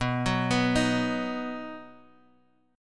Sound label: B Major, Guitar, Open F; arpeggiated; 11,13,11,10,x,x; JGuitar